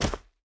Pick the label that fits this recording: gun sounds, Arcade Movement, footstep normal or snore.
footstep normal